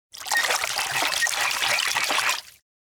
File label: duckboat row hard; anemoi